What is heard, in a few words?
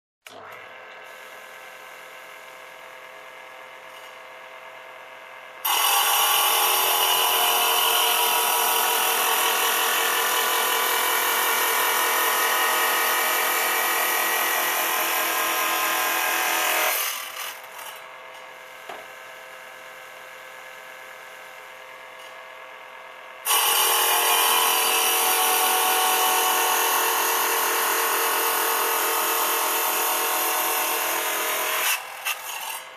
Construction